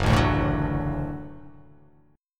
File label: Gb Minor Sharp 5th Gb; strummed; JGuitar